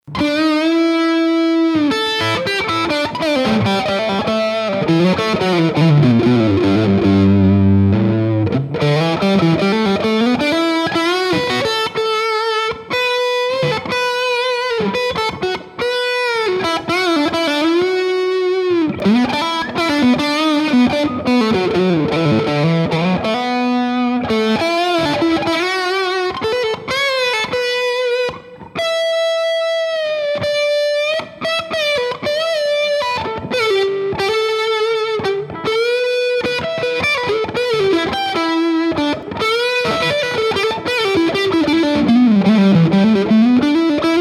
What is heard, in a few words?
Muff VS Stomp